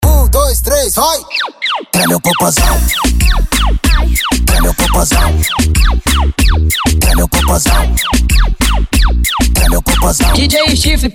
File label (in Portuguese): ROCK DOIDO